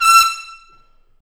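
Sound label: BRS TP STA, brass trumpet stabs; Roland L-CD702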